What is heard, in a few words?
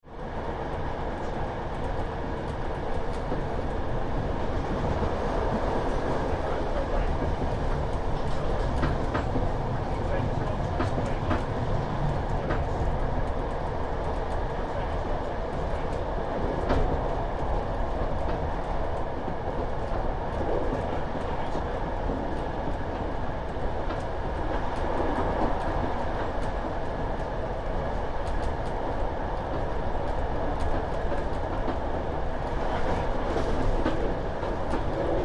Train Electric Interior Travel into Main Line Station and Stop; High speed travel; Train Sound Effects